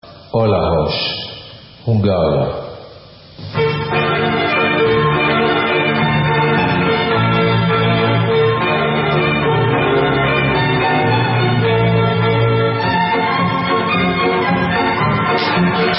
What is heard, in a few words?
Hungarian Instrumental; Olahos